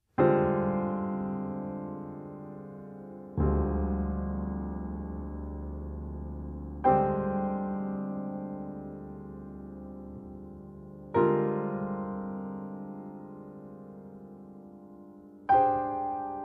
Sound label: Dmin7b5